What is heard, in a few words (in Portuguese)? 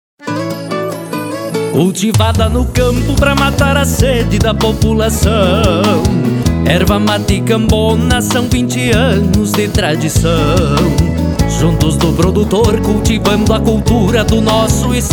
Jingle Erva Mate; Cambona